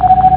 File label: blip blip